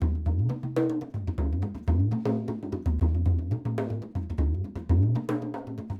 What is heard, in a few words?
talking drum